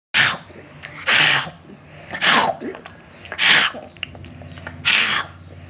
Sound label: loud bites